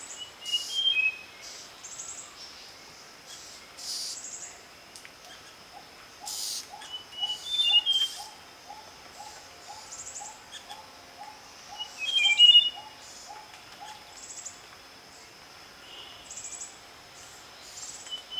White tailed Robin Myiomela leucura, white tailed blue robin call